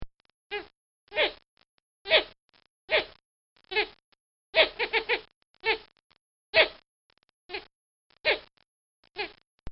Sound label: Animal Chirpping; Chirps